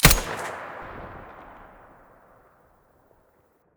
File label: muzzle stereo, weapons; fort